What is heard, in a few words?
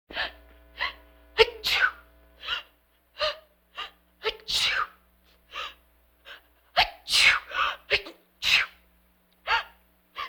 Vocal effects breathing Moaning Baby